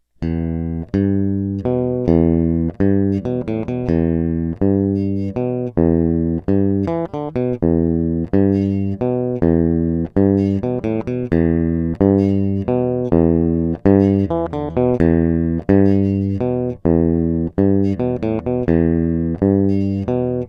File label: Elect Guitar